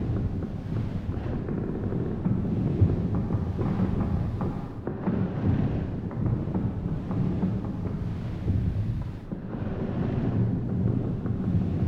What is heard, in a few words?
giant windmill loop; Hollow Knight: Silksong